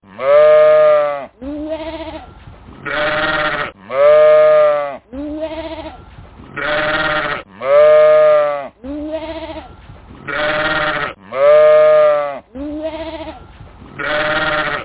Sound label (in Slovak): ovca; Spoj zvuk so zvieraťom, ku ktorému patrí.